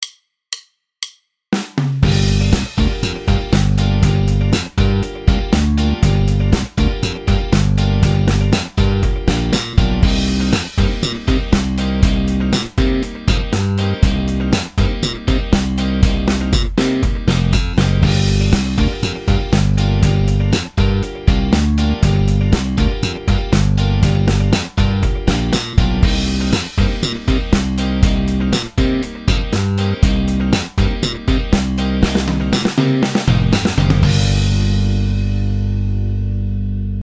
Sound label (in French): slap exercice, basse; exercices